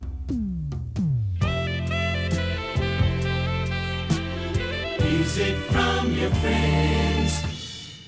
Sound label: topformat maxi jingle single